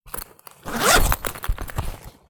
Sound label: foley bum bag close zipper